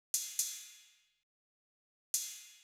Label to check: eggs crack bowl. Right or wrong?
Wrong